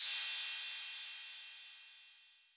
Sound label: Cym B 808 Decay B